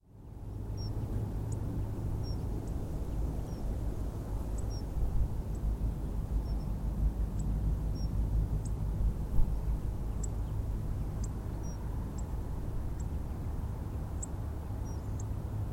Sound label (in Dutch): Appelvink; De Nolledijk orstiaan Beeke